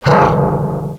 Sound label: hugh, Echo (inside caves, underground ect.); DS; ML BOWSERS INSIDE